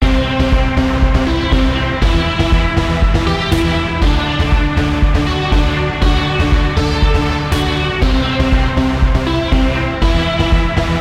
nayami huttobu club bgm

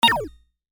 retro game

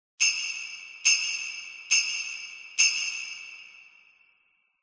Tree bell like a snow

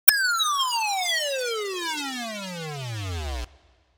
drop

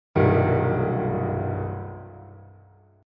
gaan

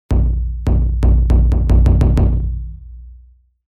Wadaiko renda

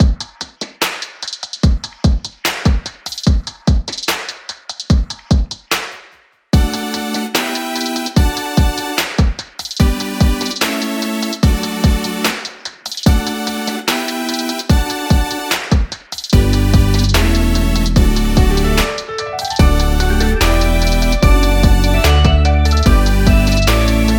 Andante

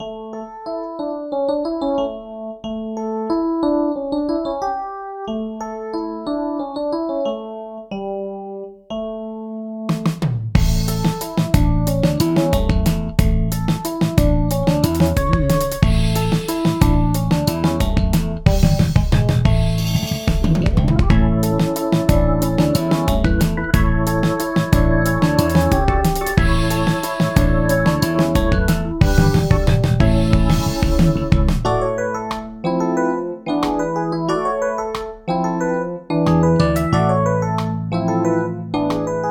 A little unique band music

